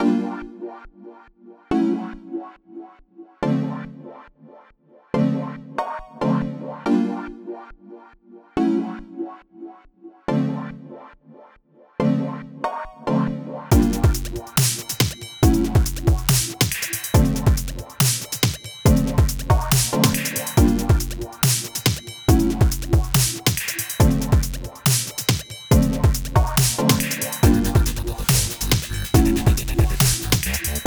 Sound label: Isochronic Tones; Energize; Fast Moving; neurotunes